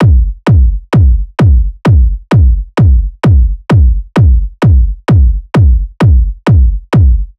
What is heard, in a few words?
Kick Loop 130, Techno